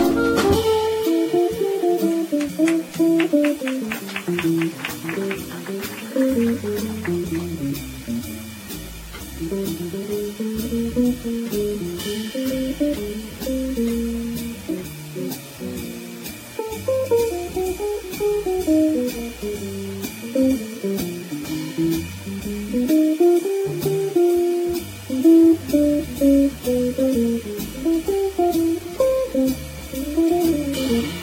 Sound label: Solo